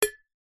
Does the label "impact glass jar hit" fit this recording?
Yes